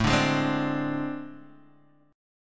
A Minor Major 7th Double Flat 5th A Guitar Standard, chord; AmM7bb5 strummed; 5,5,6,5,3,x